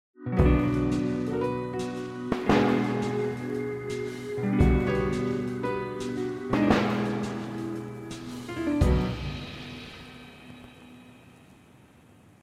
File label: music